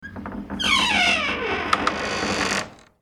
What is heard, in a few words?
Door Squeak